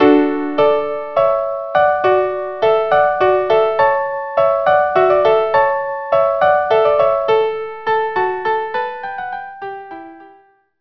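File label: Hornpipe violin duo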